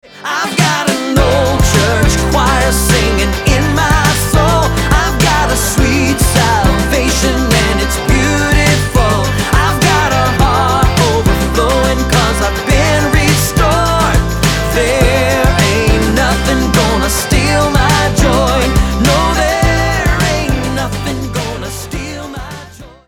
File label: old church choir